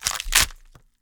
bone break

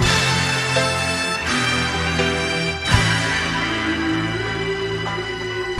horns, Loops

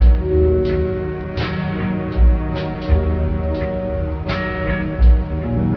keys, sad loops

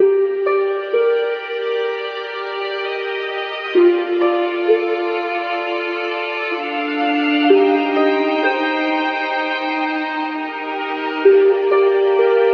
strings heartbreaking, Sad Loops

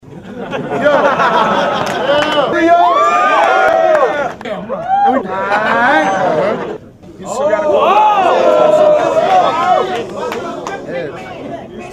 crowd

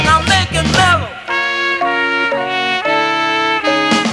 horns, phonky loops

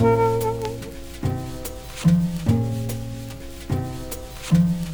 bass, Loops

